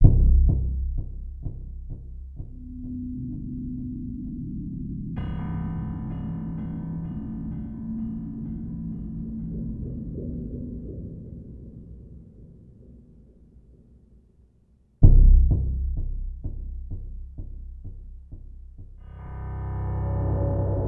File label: Amb Indoor; The Hobbit; PS2